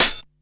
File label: HH CLOSED; USB Soundscan vol.20 - Fresh Disco House I